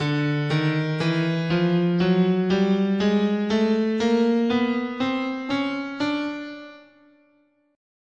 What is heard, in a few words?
D Chromatic, guitar, scale